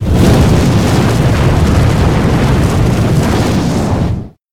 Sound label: firebuff transform; gwyn